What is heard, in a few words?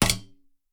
arrow metal, attack impact